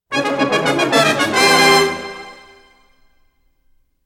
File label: Doorbell Fanfare; Meet the Robinsons 2007; official soundtrack